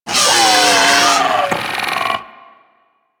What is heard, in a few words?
Vocal Death; Warframe; Corpus; Arachnoid Wraith